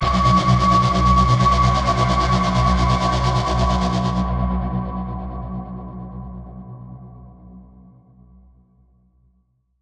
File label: Tension Builder Impact